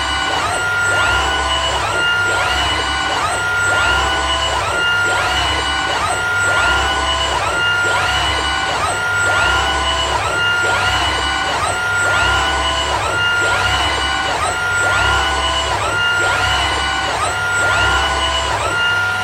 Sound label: robot